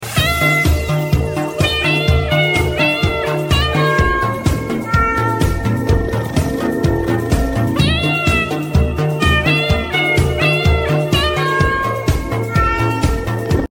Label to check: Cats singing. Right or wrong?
Right